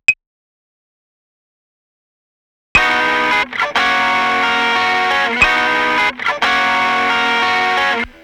1拍目

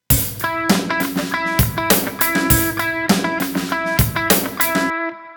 アドリブ

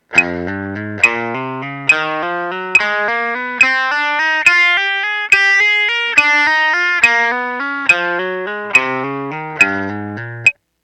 ハンマリング練習

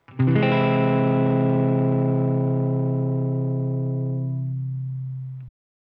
オーギュメント

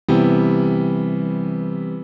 メジャー 7th, guitar

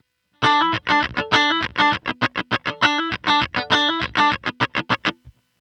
jc カッティング

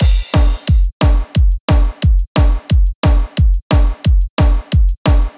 リズムパターン

